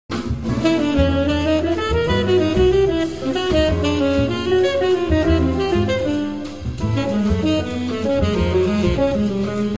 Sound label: melody